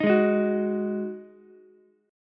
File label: D sharp Minor Double Flat 5th, Ukulele; strummed; chord; 8,8,11,9